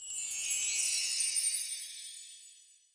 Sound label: Sprinkle; cartoon network punch time explosion xl 2011 gamerip